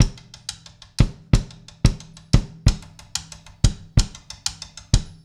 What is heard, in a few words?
sticky rim, Drum loops